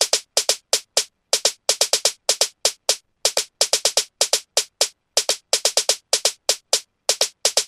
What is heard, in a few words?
Ambient House Snare Trap 125BPM